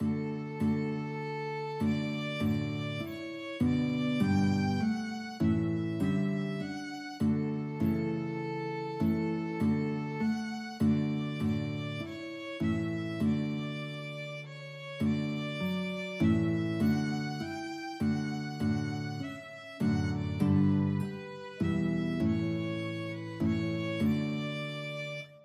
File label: wiltshire violin guitar duet